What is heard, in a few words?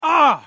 pain